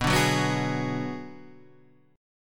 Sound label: D 6th Flat 5th B